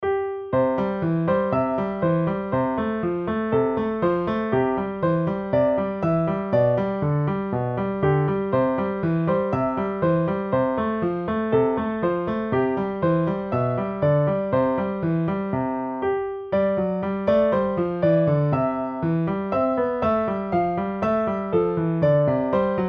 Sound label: Piano; Stand Up for Jesus; piano solos